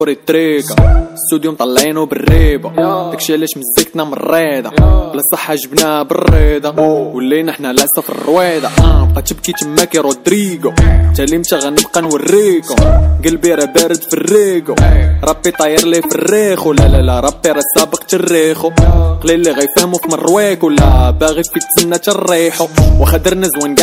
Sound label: best moroccan rap ringtone